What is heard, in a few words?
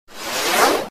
sms quick; ringtones